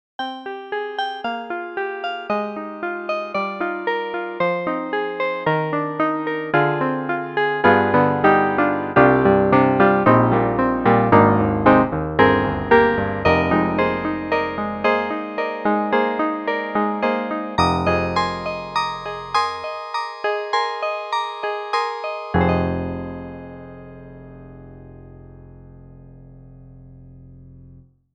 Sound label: FM Bright Grand; Steinberg HALion 7